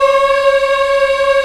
African Mm f; Roland LCDP11 Africa VOL-1

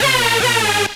Stab; DOA breaks; Old Schools Stabs

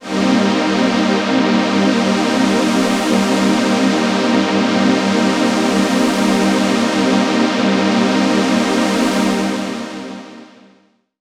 Pad C2, Club Techno; Zero-G

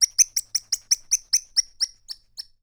BIRD CA, JUNGLE SOUND; Voice Crystal - Steve Reid Definitive Percussion

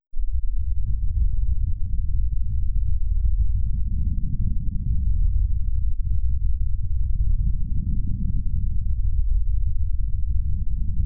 Rumbles; AMG - Prototype Drum & Bass

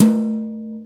Yamaha EX-5

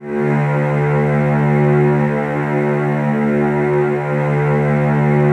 Virtuoso Strings, edgy, D1; ILIO; Kirk Hunter Virtuoso Strings VOL-2; 90 s Sample CDs

